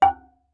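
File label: Marimba deadstroke fortissimo Eb4; MIS Pitches - 2014; Percussion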